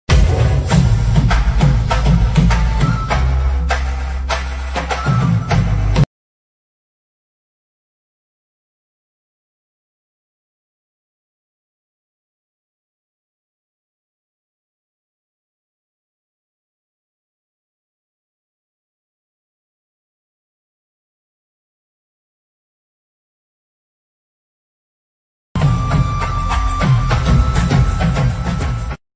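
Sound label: background music ringtone lofi ringtone; peddi; ram charan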